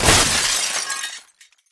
Glass Wood

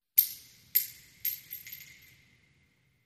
Shell Case Sngle Revb, shell casing movement

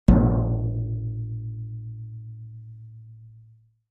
Drums Toms C Low Hits

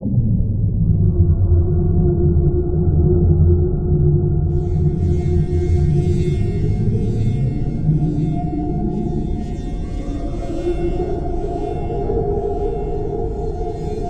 Ion Idl Elect Zaps Risin, deep

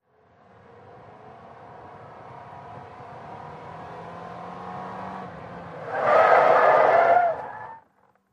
Sm Car 180 Rev Skid, compact

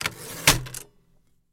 Typewriter Carriag; typewriters

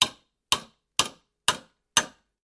hammer hammering

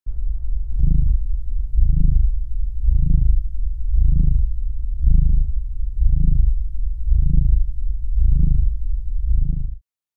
Body Scan Low Synth

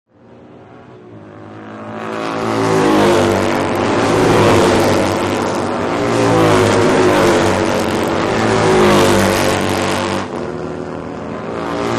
Motorcycle Road Racing

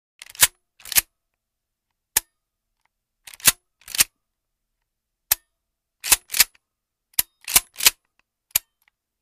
12 Gauge Shotgun Pump; mvmt

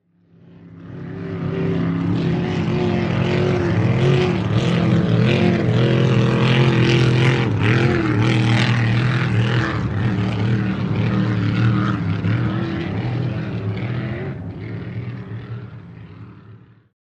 Power Boat By Fast, off shore power boat

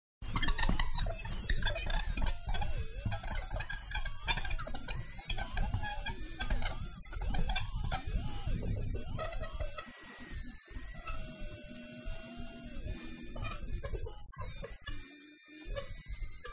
Feedback Feedback

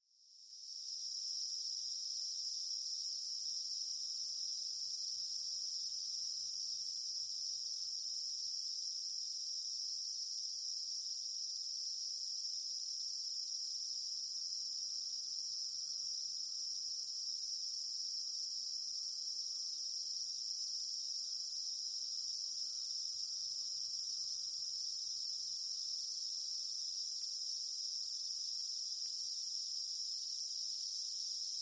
Cicadas From Montana

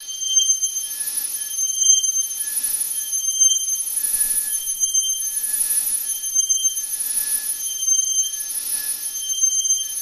Laser Mining High Pi

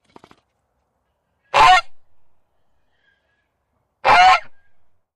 Goose Honks Head Shak, goose

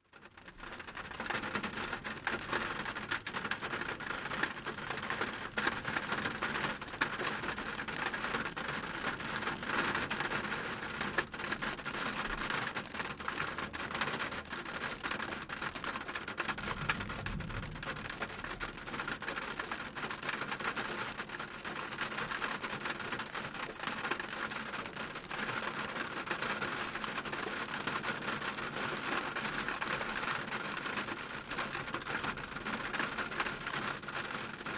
Rain Light To Heavy, raindrops, splatty

